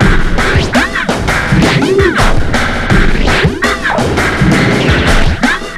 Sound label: WEIRD BEAT, 83 bpm, Breaks; POTBreaks